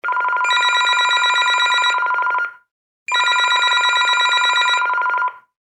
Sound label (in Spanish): telefono